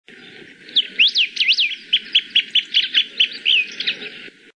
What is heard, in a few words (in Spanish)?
voz pepitero de collar